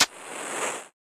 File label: match